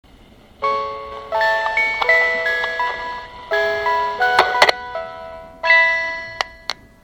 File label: chime; kyusyu